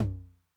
Tom Hi Low Tune Max Decay, 909

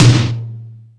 Lazer Drums, drum machine; E-MU Pro-Cussion-1

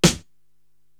SD Military Man, Korg TR-Rack drum machine

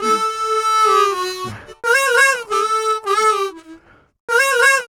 Harm 098bpm A, Harmonica

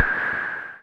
noise fx; Masterbits; Switch FX

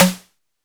Snare; Noise Engineering Basimilus Iteritas

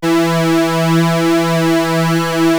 JUNO 60 E4

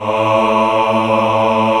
MALE A1, choir

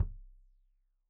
Kik Stereo; Deeply Sampled Drums; SMDrum

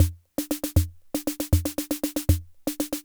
Loop, classic machines, rhythm77

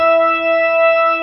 B3 E 4, organ; AKAI S-Series CD-ROM Sound Library VOL-1

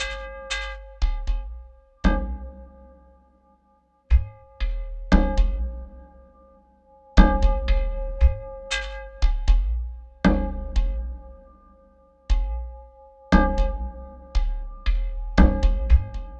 Quantum, Processed Percussion

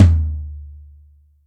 Dry Lo, Acoustic Tom; Yamaha DTXpress

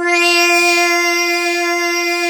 ARP 2600

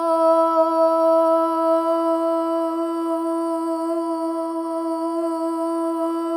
SOLO BOY, OH; Spectrasonics; Symphony of Voices

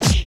FIST HIT; Total Control - Action Cutz

